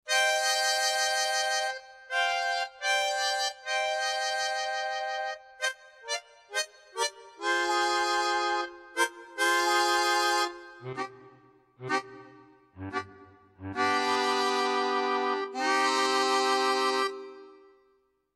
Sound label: Harmonica Wah, KORG